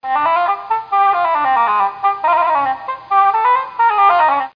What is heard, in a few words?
BASSOON; DR BRAIN; BUGS